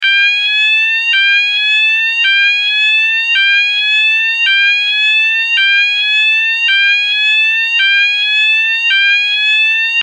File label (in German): Ton